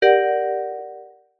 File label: fantasy magic wand ping retro style; zapsplat